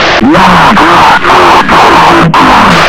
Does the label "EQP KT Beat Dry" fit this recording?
No